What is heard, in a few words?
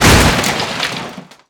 wall crash